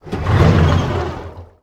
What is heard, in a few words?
flame tank start; nod